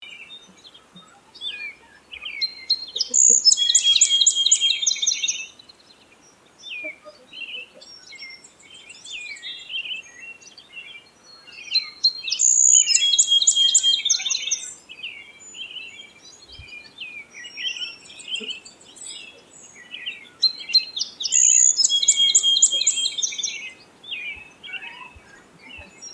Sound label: song sparrow